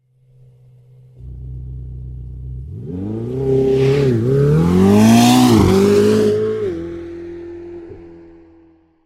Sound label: Ferrari New V8 Start from standstill exterior